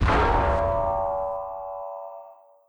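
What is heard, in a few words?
Rivet Hit Exterior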